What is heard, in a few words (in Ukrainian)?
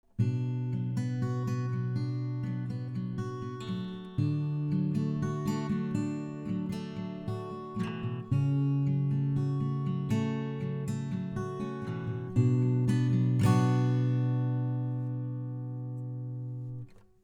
mic test gitara; Arturia CM-1 - Тест Гитара